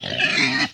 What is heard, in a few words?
pig oink; Animals